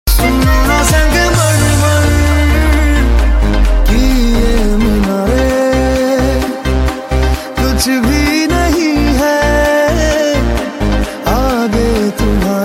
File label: remix ringtone